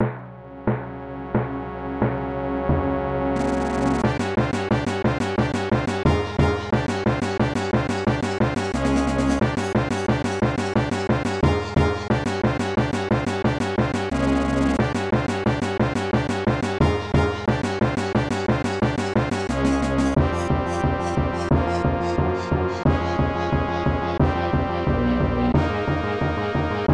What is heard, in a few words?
Battle with Bee Swarm; Bushi Seiryuden; SNES